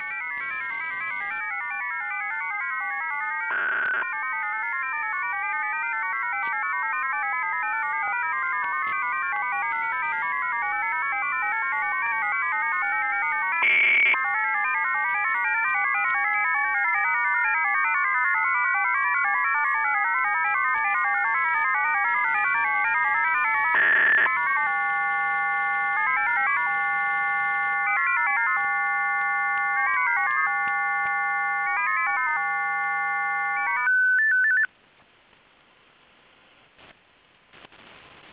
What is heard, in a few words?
mfsk-16x5 psk-2; msk 15812 khz usb